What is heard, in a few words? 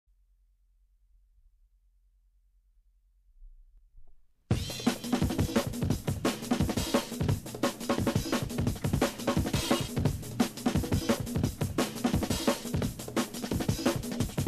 Drum and Bass Jungle and Old Skool; Old Skool 10th March; UK Bass Radio